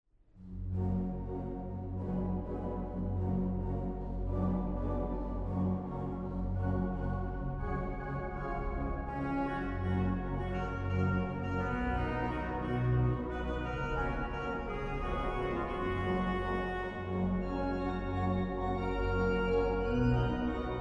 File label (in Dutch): orgel; Mien toentje